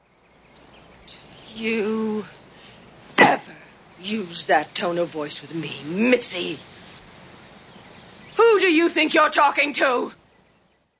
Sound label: that tone